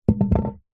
cd disc drop carpet; household

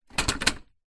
metal chair tube small fall over concrete

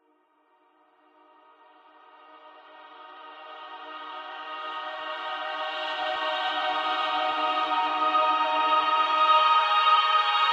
musical heavely euphoria happy dreamy swell; zapsplat

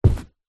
footstep single carpet wood floor boots walk; foley; zapsplat

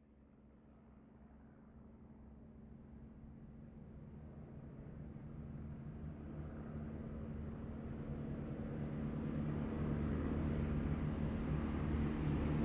squadron de havilland tiger moths fly past

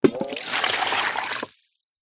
water pour from metal bowl into plastic container

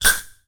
household medicine inhaler spray; sound effects one

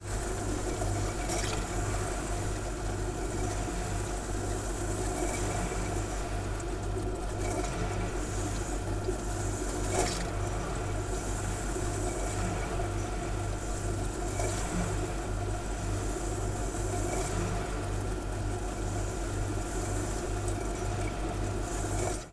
refrigerator inside hum, household

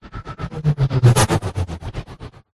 whoosh; Audio Mangler

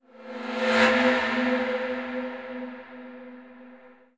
Transition Slash; bottlerocket

